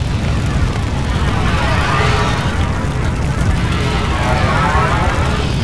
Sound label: fire mystical